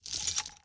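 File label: Inspect Shell Movement; Valorant; odin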